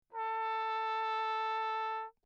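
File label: trumpet mute; Lechner; Perinétventiltrompete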